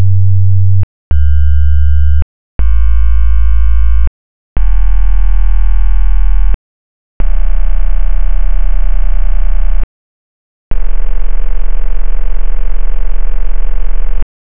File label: Basic Doppler